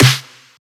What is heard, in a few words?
snare belly; Drum One Shots; SD MIDNIGHT Sample Pack; Slate